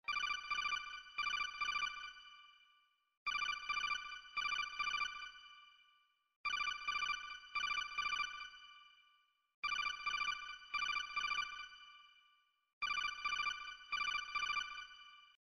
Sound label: Codec Ringtone; Metal Gear Solid